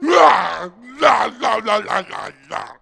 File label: death sound; bumbino